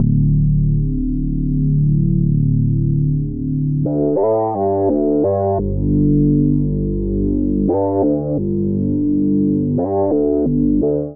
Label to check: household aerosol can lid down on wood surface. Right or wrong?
Wrong